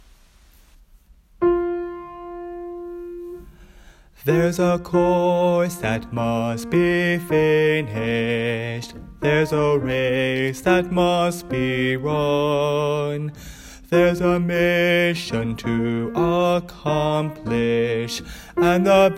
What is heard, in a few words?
Melody Piano